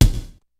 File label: KICK SNARE; Kid Nepro; Elite; KICKS SNARES